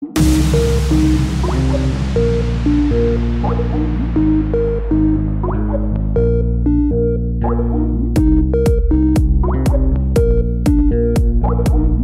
Skype Call Remix; Remaster

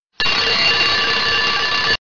alarm clock ring

